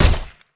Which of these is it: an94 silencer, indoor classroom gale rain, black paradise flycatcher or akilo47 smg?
an94 silencer